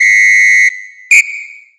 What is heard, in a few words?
whistle, electronic instrument